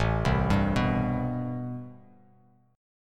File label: A-Minor 9th A Bass Standard; arpeggiated; JGuitar